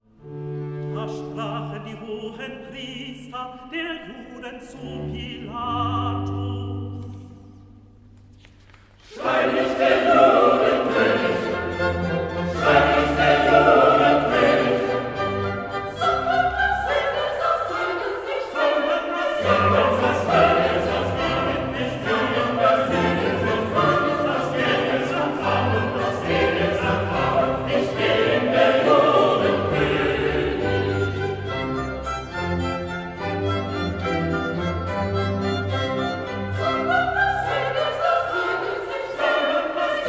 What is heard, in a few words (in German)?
Recitativo Chor; Schreibe nicht